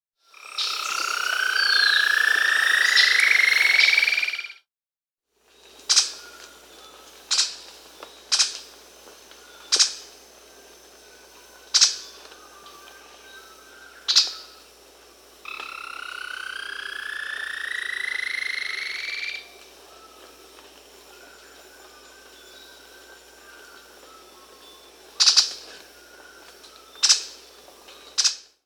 Cinnamon-rumped Foliage-Gleaner (Philydor pyrrhodes), Peru; S. America